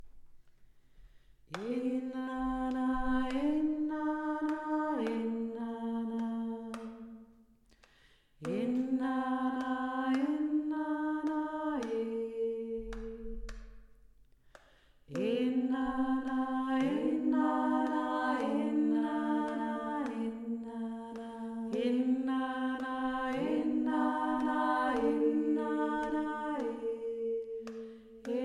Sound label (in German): gesang der gro en mutter; Mutter afrikanisch; Enana Enana Enana / Enana Enana Eh / Eh di na na e wa / ewa ewa - ewa ewa; Enana vier Stimmen